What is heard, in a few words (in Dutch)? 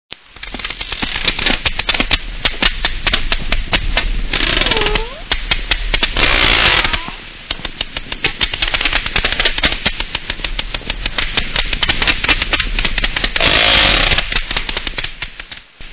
potvis; walvisstrandingen